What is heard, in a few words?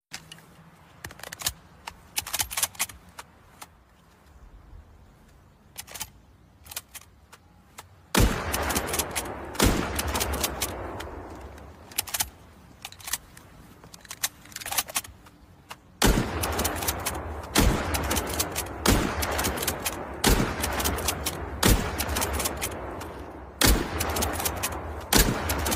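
Lee–Enfield, gun; لی انفیلد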